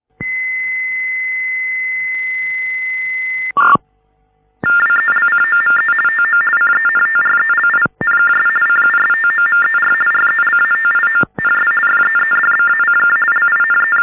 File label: tty tdd, sound of modems